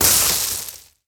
Bodyfall Gravel; SFX Kit; Bodyfalls

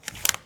card swipe; Apotheorasis

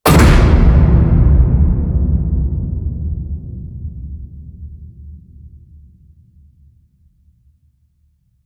HIT Slam, Cinematic Hits Designed

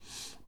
breath heavy out; Apotheorasis

